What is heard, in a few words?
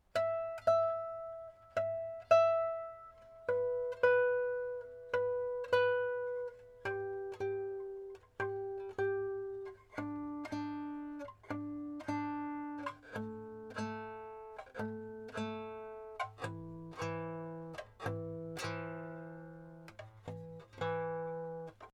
range intonation; Cervantes; Intonation Test (Harmonic + fretted note @ the 12th fret); tracked through Schoeps CMT56 mics into Trident A-Range preamps